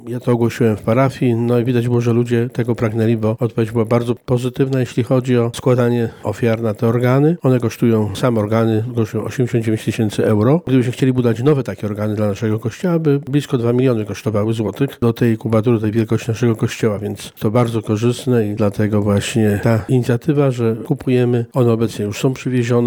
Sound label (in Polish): organy